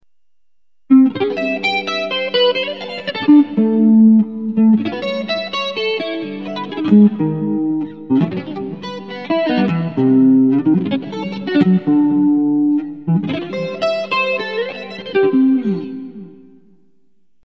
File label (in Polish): arpeggio